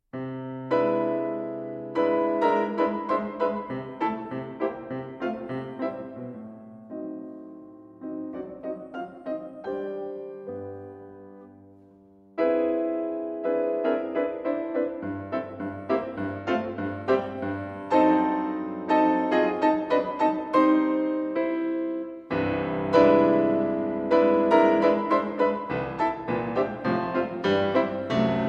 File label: Prelude in C Major For Piano